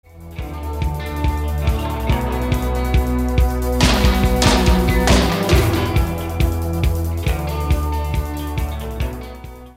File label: drums